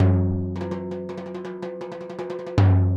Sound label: timb cow 80, latin, 80bpm